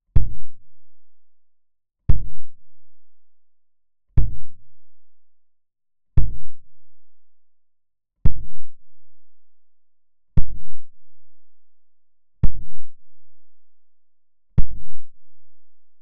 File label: Kick Close Kick Pad